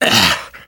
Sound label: hurt minor, survivor voice; namvet; Left 4 Dead 2; left4dead2 dlc2